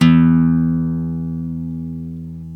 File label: GTR Nylon, Roland; NylonMartin; Club-50 - Foundations